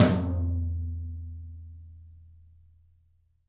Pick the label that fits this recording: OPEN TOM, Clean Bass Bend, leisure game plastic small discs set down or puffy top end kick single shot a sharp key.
OPEN TOM